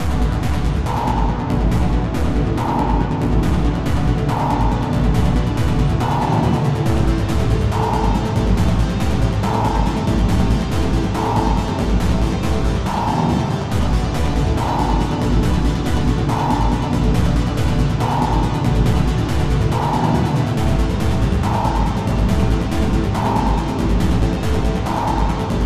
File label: Combat; Mass Effect 2; Purgatory